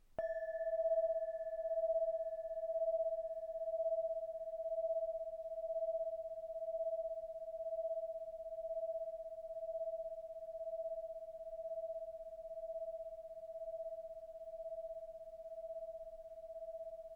Cloche tubulaire 25mm E5

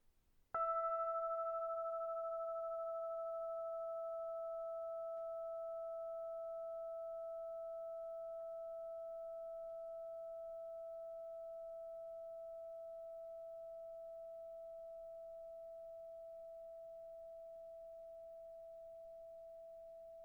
Cloche plate E5

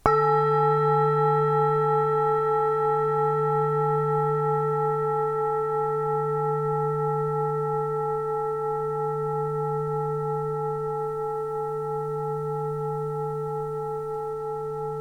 19cm mailloche hard

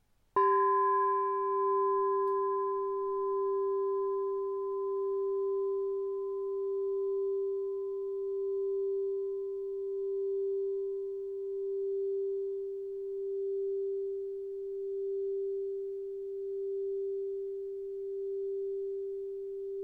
cloche tub 50 G

